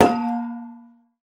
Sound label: quest cancelled; Mount&Blade Warband